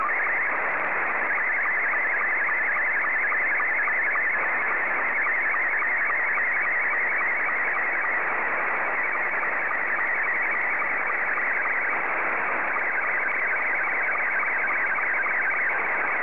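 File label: SSTV, Scottie 2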